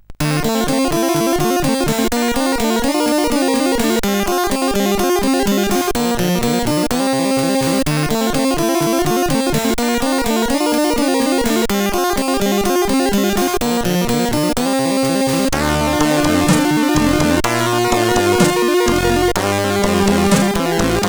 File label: sid, CSG8580R5